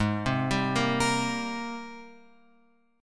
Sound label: Gsharp Major 9th; G#M9 Chord {4 3 5 3 X 6} arpeggiated